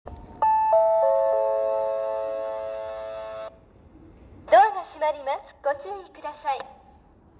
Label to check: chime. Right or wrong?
Right